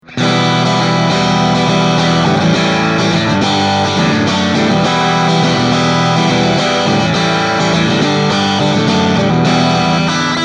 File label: Crunch Mid Bridge Single